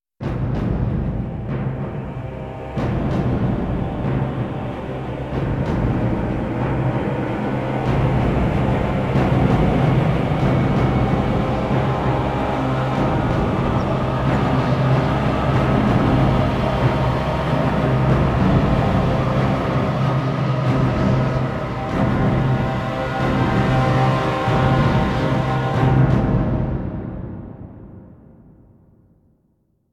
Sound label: victory fallen; Armies of Exigo